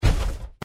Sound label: run, power armor; clothing system